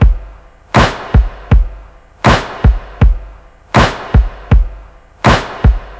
Verb Soft A, downbeat

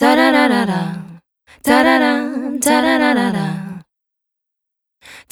Ba Da Ba Double; Сэмпл вокала (Легкая музыка)

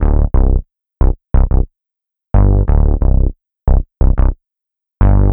Biter, rap

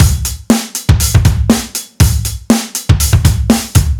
Metalized, alternative

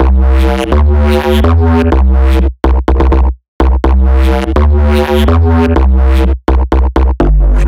Binary, break beat

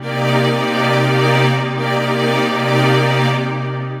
String Ensemble; Deep House

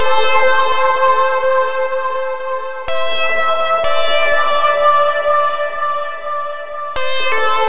Sweep Lead; Big Beat

